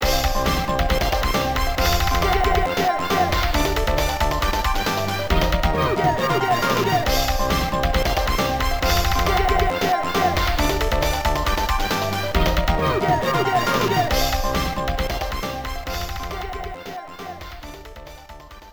Congratulations; power spikes ii arcade; Hyper League

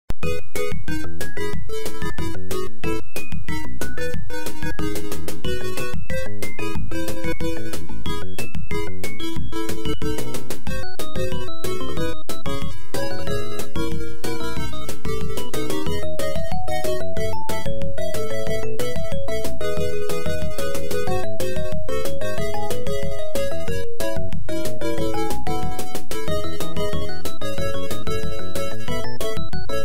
In-Game Music; Bouncing Balls; arcade

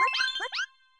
Glad

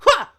rage

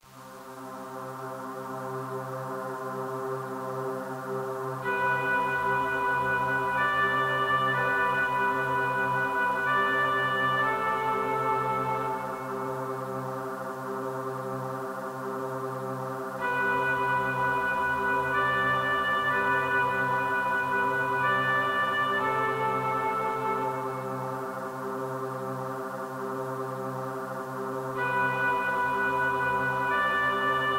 Flutes Hum; Lisa the Chipless soundtrack

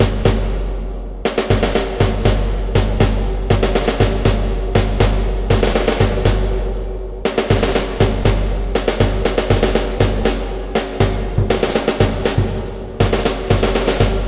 military drums; fighting space

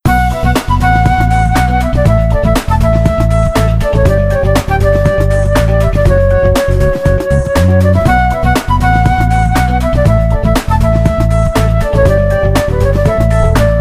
Forest (Looped); Hell Girls

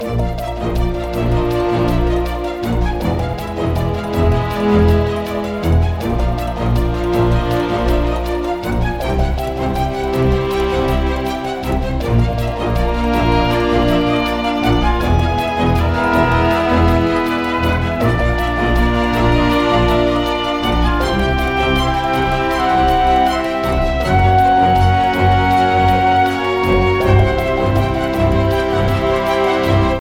Menu Store Cardpack Loop

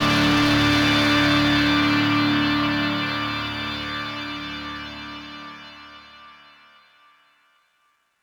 Oneshot Guitar; zombie army 4 dead war ps4 stadia switch windows xbox one gamerip 2020

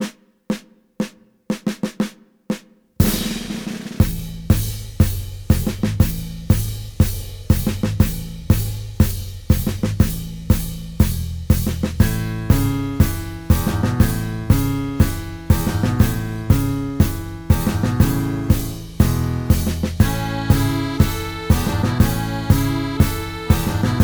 Music End Rich

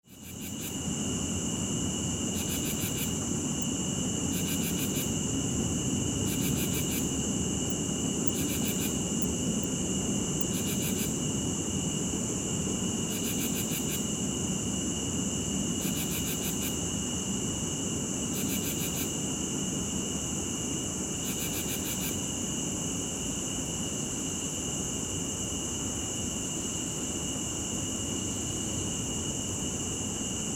crickets cicadas night

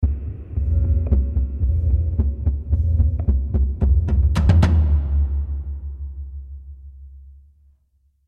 Combat End Tag

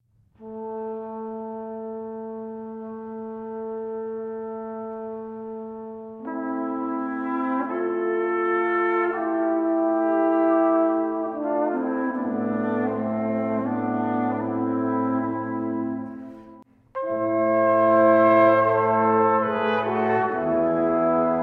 BGM others login; Babylon's Fall

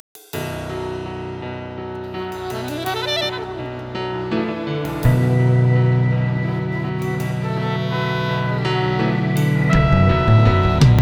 Swing; Touhou Swing Holic Vol. 15

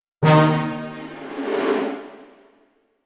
Stinger Gasp; Costume Quest 2

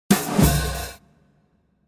Combo

